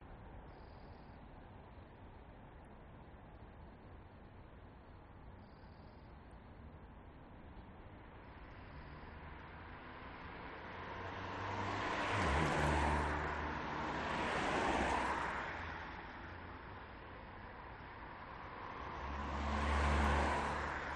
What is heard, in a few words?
urban traffic wet road rain newcastle upon tyne uk